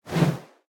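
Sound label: movement swing; Smite; Cabrakan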